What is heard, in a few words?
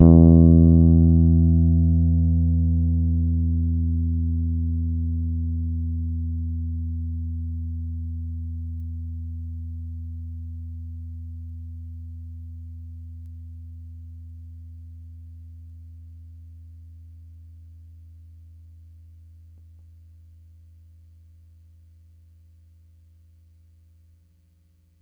Ds E3 hard, Bass, Pedulla Thunderbass; Giga Samples Collection